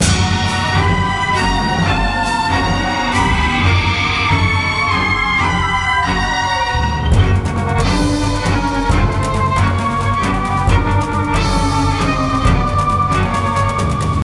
Imperial Battle; Lego Battles 2009 NDS

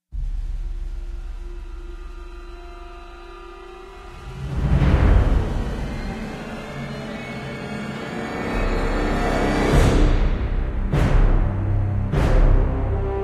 150bpm Full Mix

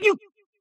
jump; Mystical Ninja Starring Goemon

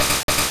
Crunch

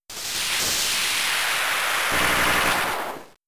Luster Purge; Pokemon; Gen 3; attack moves; RSE

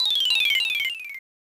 Title Fanfare; miracle girls 1993 snes

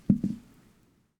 foley control put down

